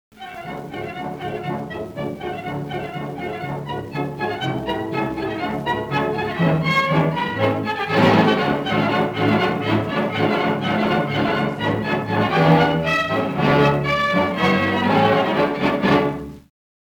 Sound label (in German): klingelton radetzky marsch